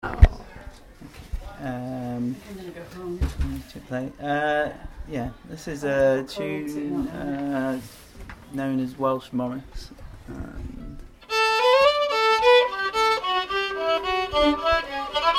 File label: Welsh Tunes - Welsh Morris; ZOOM